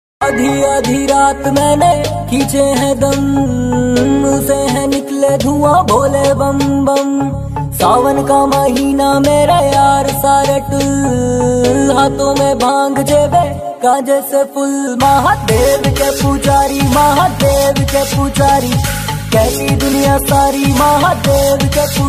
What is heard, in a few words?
song ringtone; lord shiva